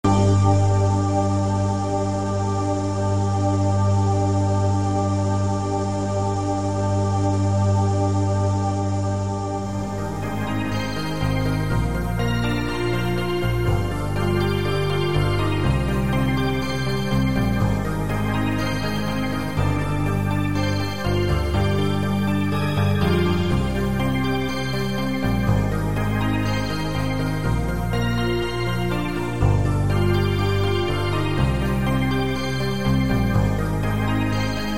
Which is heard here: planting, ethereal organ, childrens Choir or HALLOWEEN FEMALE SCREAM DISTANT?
ethereal organ